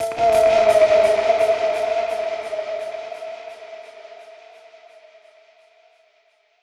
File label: Perc Hit, dub percussion; musicradar